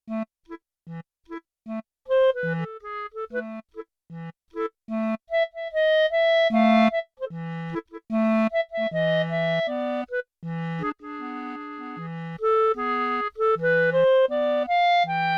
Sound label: Bright, Yamaha TX16W Bb Clarinet